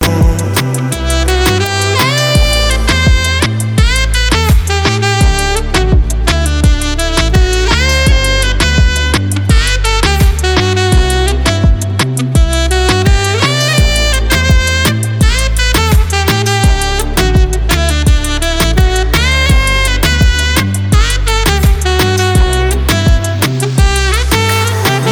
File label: Ringtone